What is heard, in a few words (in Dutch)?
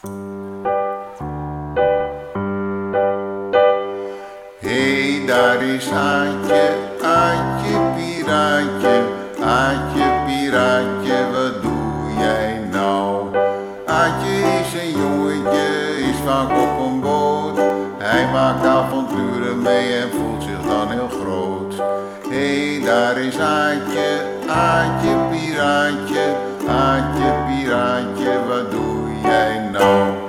met zang; Bieb Almere